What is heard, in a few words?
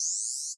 lasersight; hedgewars